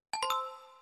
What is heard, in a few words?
zte call accept